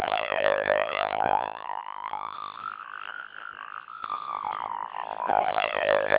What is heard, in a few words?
siren, CODEC2 1200 bps